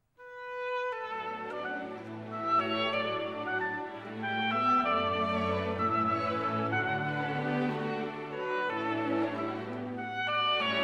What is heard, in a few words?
Oboe Concerto, Allegro; Strauss, Richard